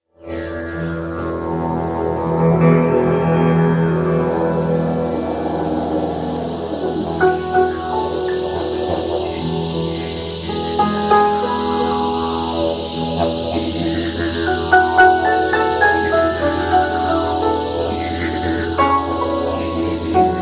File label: improvisation for piano; didgeridoo